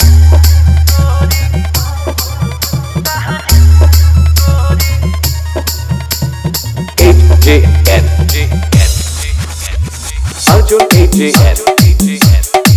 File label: Dj Hard Bass Mixx